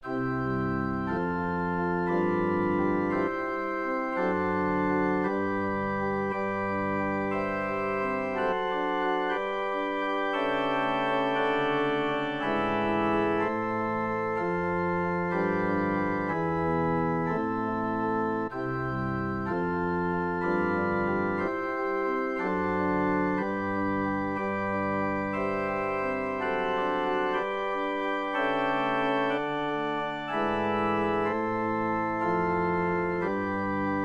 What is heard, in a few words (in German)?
Orgelkonzert